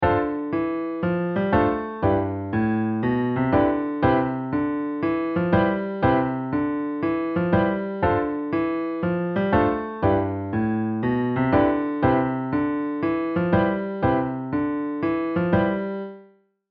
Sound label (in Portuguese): Walking Bass Padrao